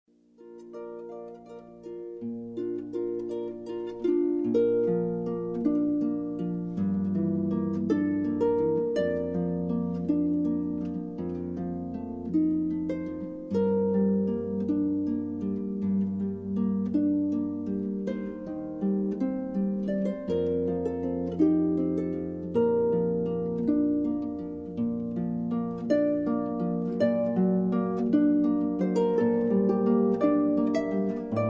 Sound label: Ave Maria Schubert arpa